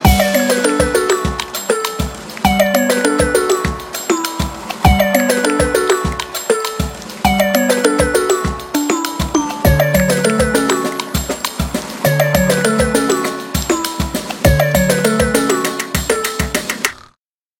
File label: Food Practice (100 Bpm); Melatonin